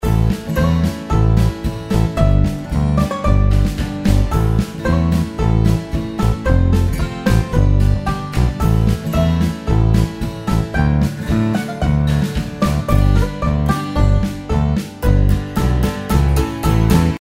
selector Screen Music; Dynamite Digger Eureka

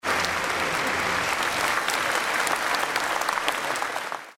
outro audience; without music

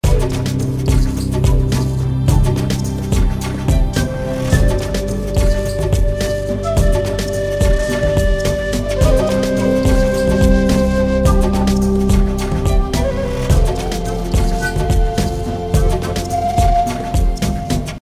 bonus Prize background Music; Shamans Dream 2

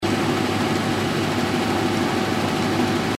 reel spin; Hot Off The Press; Eyecon

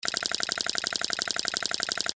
number rollup; Fondant Favourites StarTrail; Eyecon

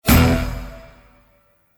gold Symbol Land; Lucha Rumble

